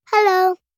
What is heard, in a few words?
chhi, baby